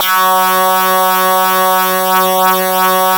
MS20, LEADS; Masterbits; Vintage Voltage

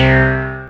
Industrial Analog Single Notes Short; Sonic Foundry (Sony Creative Software)

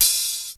HIHAT; E2 DRUMS; Crypton - DrumBase5000

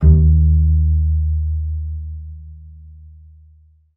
D#2, bass pizz; Xsample VOL-5

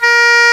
oboe, FAIRLIGHT CMI IIX REEDS

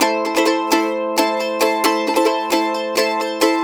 132, Blues Guitar RIFF

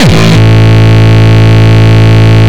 Longkick, kicks

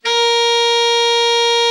ALT, Sax, ALT.VEL-FALL; Giga Samples Collection

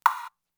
Snares, Elektron MachineDrum SPS1 MKII

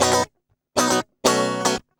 120 BPM

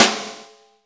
SNR, Snare; Club-50 - Foundations Roland; Ext.Snare 3

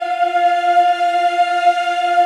F4, Choir; USB Soundscan vol.28; Acoustic & Synth; AKAI

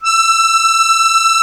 Musete, Accordians, Roland; Club-50 - Foundations Roland

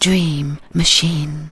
vox, Techno Trance; Ueberschall

